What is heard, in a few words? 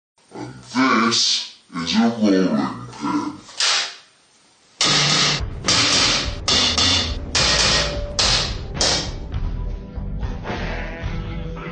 rolling pin